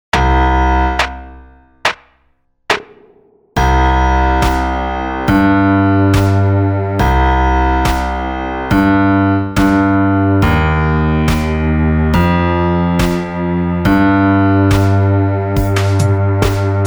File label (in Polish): Bebenek BAS; Jezu Ufam Tobie